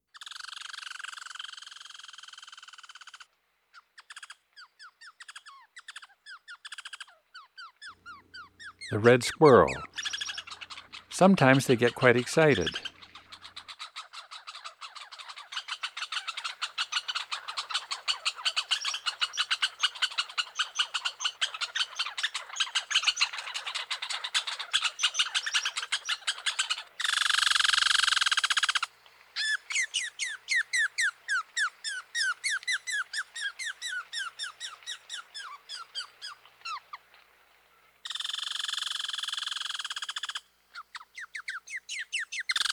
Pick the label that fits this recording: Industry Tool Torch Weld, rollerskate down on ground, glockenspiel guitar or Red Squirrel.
Red Squirrel